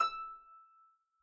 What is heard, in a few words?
Harp Normal E5, Folk Harp, Composite Chordophones